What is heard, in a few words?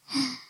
Cute Breath